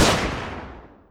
Grenade Expl; grenade exploding

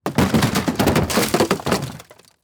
wood plastic debris, waste

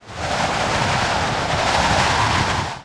LAND, strong wind

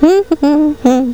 BROOM HUM, child humming

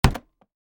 briefcase close

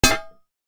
pipe bang

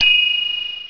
COIN FLIP

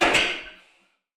wood stick drop

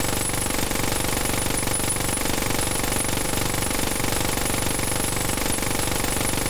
MG Fire, gunfire

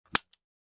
colt 22 handling

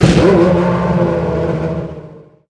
truck start

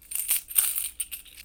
coin jingle

